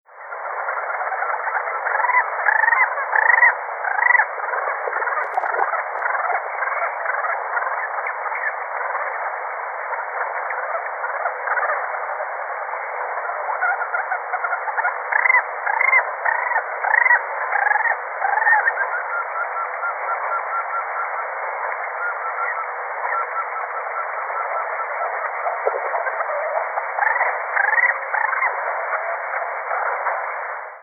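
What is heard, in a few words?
kiji female, Green Pheasant; キジ; 雌; 愛知県豊田; 渥美美保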